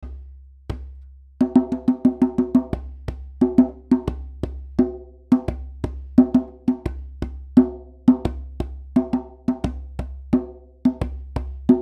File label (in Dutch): Djembe Bas Toon